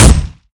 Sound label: smg fire, weapons; hl2